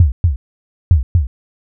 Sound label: heartbeat